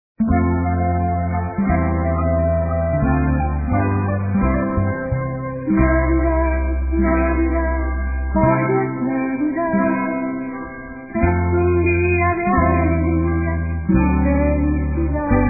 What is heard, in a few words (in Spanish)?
campanitas de navidad